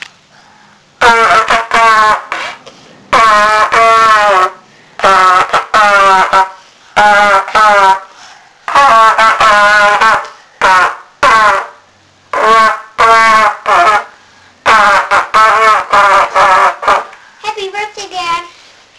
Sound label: trumpet birthday; A birthday tune for you, Dad.